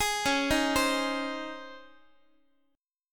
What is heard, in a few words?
C sharp Major 7th Suspended 2nd, Guitar; C#M7sus2 arpeggiated; JGuitar